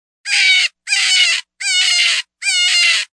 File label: Grown Cottontail